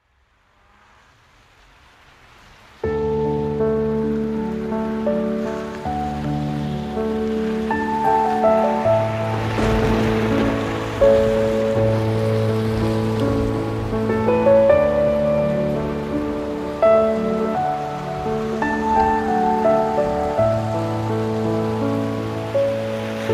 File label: Piano and Rain